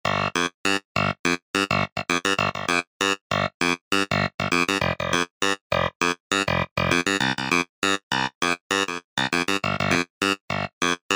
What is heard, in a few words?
Clavinetti, E-mu Proteus VX